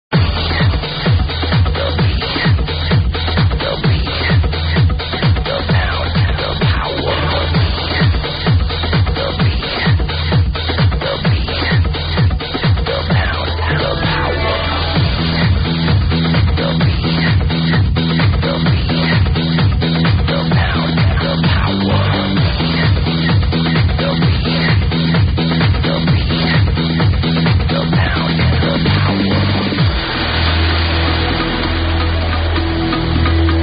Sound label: techno